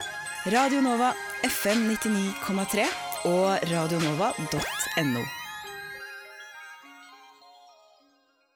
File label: radio jingle; fm 99 3 og radionova